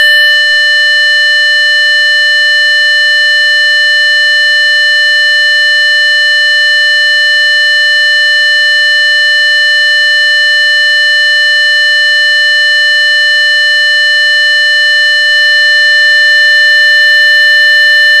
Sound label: special warning